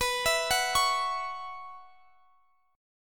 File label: B Suspended 2nd Suspended 4th, Guitar, Drop D